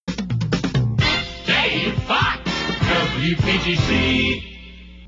jingles; positron

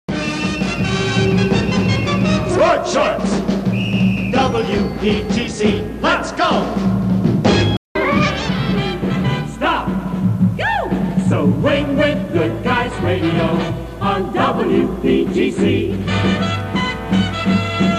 jingles; 1966; mark century

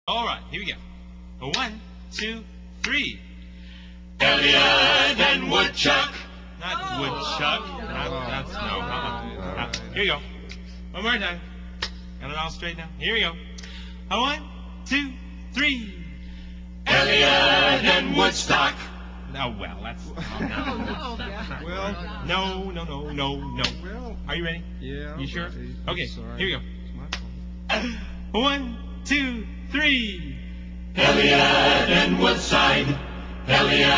jingles halleluah choir

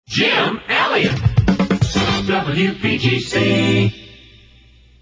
jingles; positron

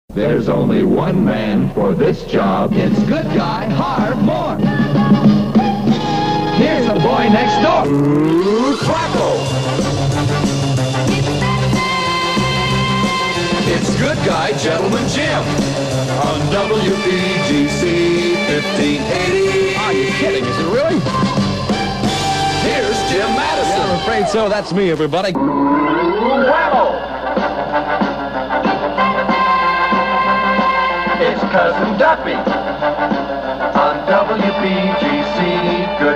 jingles montage; 1966; spot productions

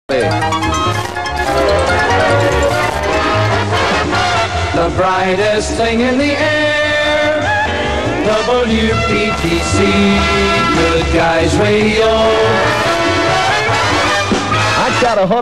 jingles; 1966; brightest thing in the air; pams

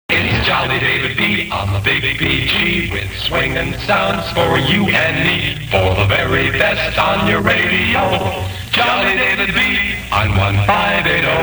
jock jingle; jolly david b simmons

